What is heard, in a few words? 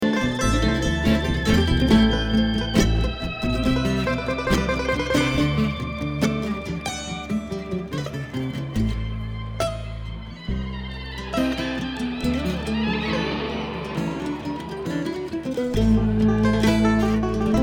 Traffic Jam